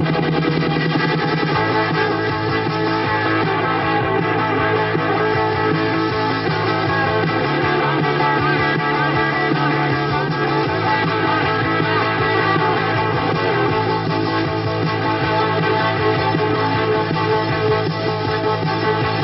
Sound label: instrumental